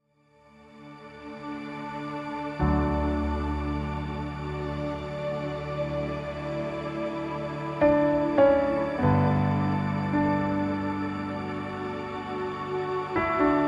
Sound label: Majestic Pads; Analog Atmospheres; pilgrimsounds